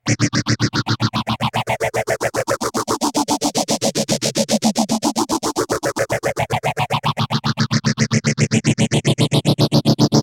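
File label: guitar, Moogerphaser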